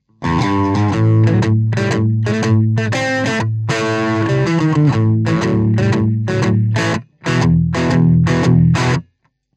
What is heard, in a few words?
lag vox bluesy